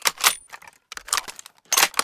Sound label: reload bolt action